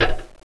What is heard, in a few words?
clang